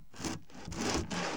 LITE BOX; E-MU Producer Series Vol. 3; Hollywood Sound Effects

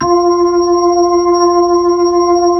B-3, Brite Ch. SL; Roland LCDP10 Keys of the 60s and 70s 2

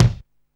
Kick, Alesis Hr16, Drums

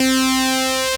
C Synth, One Shots

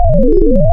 Harmonic Crossover, wavetables; Animoog

